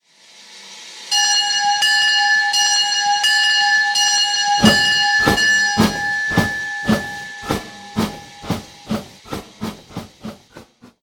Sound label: Narrow Gauge 12 Inch; RailPro